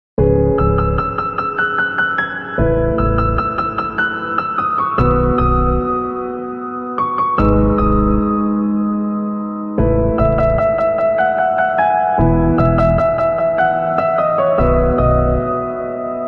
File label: Ringtone Instrumental; Thank God Movie; Ajay Devgn; Sidharth Malhotra; Rakul Preet